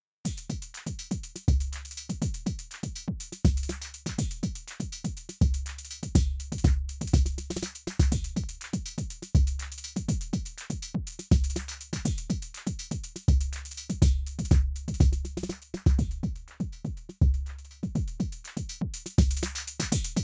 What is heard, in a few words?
Elysia nvelope EQ Mode